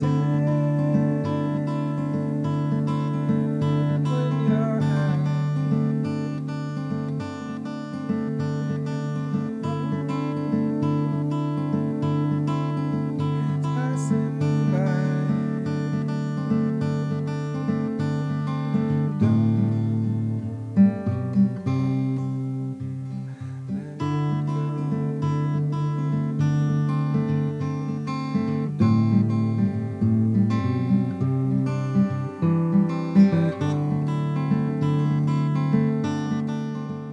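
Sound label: main guitar mic original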